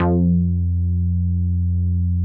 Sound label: MINI, MOOG BASS